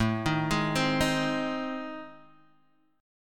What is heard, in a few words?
A Minor Major 7th Flat 5th, Guitar Open G